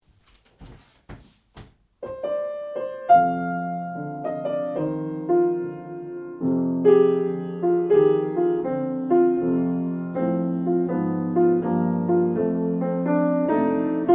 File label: blues, New Orleans Jazz Styles